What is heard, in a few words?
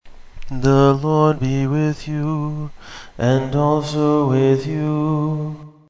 Greeting, chant; english